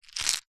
food candy sweets mint lozenges in plastic tub short movement